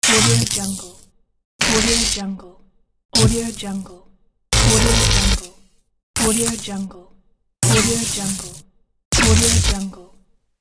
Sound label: spark